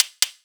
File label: CLAP Rev, EMU Orbit-3 drum machine; 300 Drum Machines